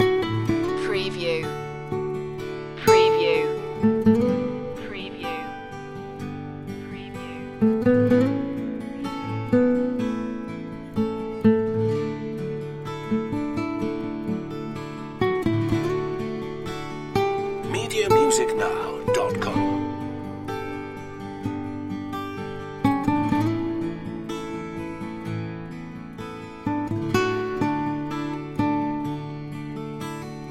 guitar loop

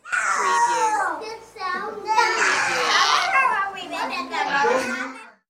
Crazy Kids Sounds

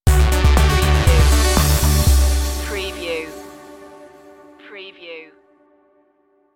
flourish stinger

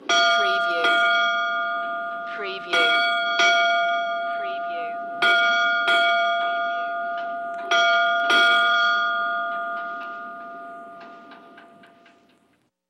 Ship Bell 8 Rings Vintage

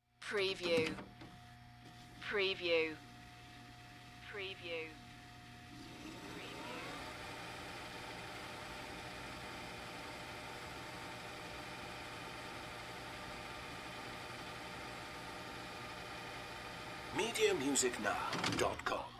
VCR Fast Forward Tape

